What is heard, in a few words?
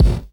BD, Dance Mega Drums, HIP